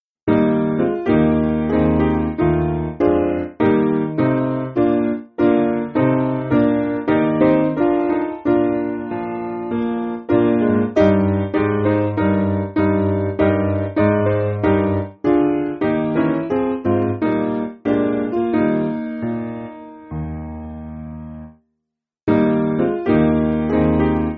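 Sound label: Piano, Backing Files for Churches; Eb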